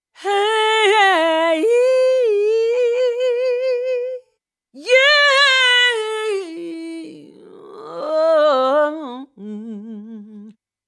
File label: Voices of Soul